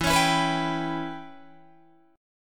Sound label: E Minor 6th guitar chord, Open D